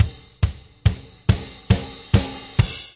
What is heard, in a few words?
six eight Fill, DRUM LOOPS and BREAKS